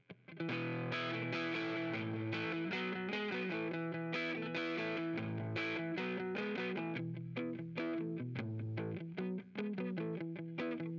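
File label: with drums live rec 250ms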